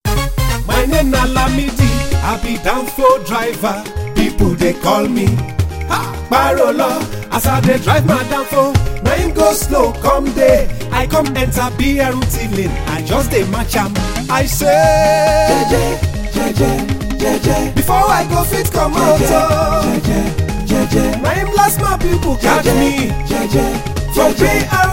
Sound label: Jingle